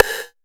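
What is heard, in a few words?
TR808 Rim Long, Roland SH-32 Drumset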